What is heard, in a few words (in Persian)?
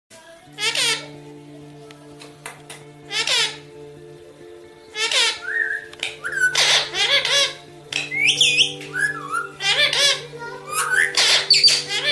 sedaye toti